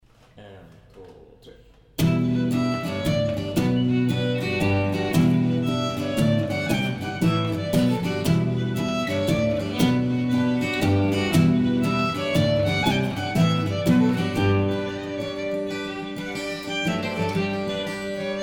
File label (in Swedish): Slipjig komp melodi och övergång; Tommy Pots